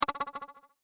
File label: multimedia rollover, Multimedia Rollover Tone